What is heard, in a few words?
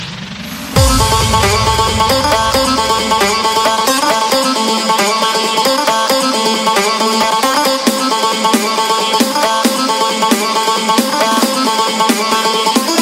swamp trap